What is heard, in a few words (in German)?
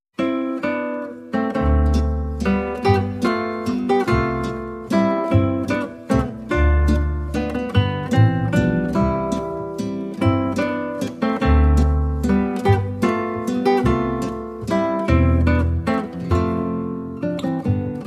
walzer